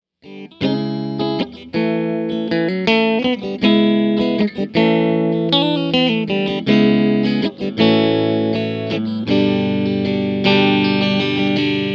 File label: Ch2 Clean Munch Hi INPUT Dano